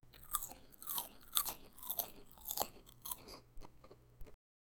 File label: food